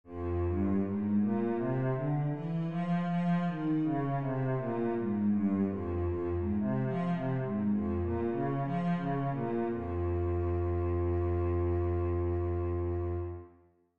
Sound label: f minor, scales, cello